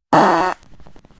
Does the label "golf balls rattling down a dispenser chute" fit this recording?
No